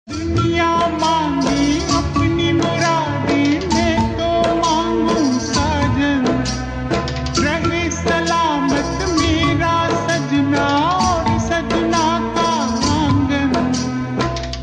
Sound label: Ringtone, slowed and reverb